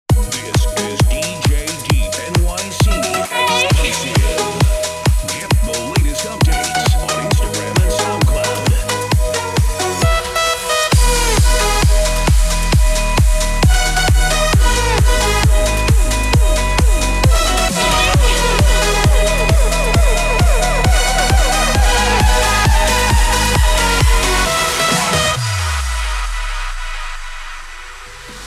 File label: edm mix; dance to the beats of electric energy